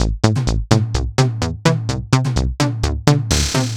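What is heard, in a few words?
No bass drum snare, 127bpm, Nu Dance Electro; Combi Loops; Night In Heaven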